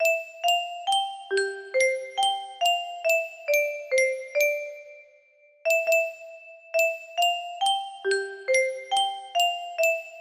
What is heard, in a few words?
melody